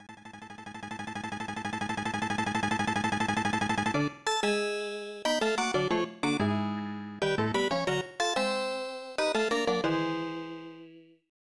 Piano Solo; Lurch's Piano Solo 1; The Addams Family; SNES

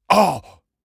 Male Fight Death; mighty party 2017 gamerip pc ios android

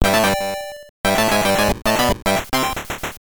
Bonus Fanfare; donkey kong; gameboy

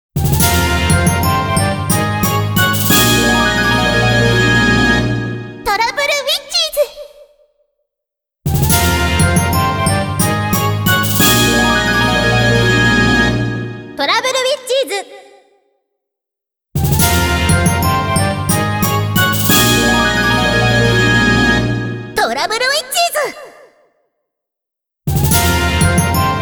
Eye catch fanfare Player Character; Trouble Witches

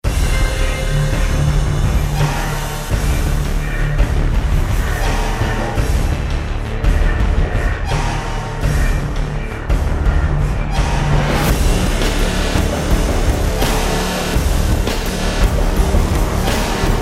turbine; sin episode 1; Docks

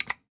Stamp Up; papers please; android

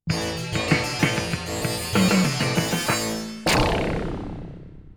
Game Start; Ninja Gaiden

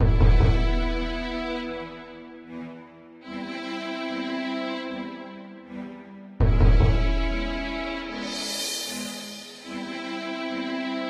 Boss Intro Loop; Gryphon Knight Epic Definitive Edition

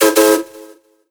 Horn; Nicktoons Nitro; arcade

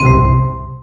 Fizz Chain; Candy Crush Soda

